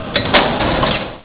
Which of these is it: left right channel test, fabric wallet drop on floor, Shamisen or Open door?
Open door